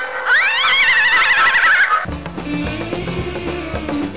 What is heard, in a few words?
meows